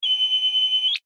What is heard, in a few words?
Scanner Beam Ray, Scifi; On, Off, Science, Fiction; Tech Sound Effects; BlastWave